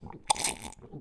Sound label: human slurp drink from cup with gulps